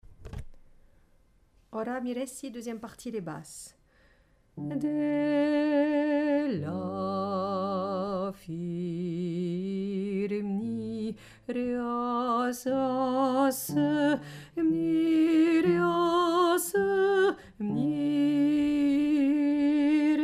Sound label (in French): Basse